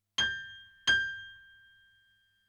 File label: Piano, RNR for piano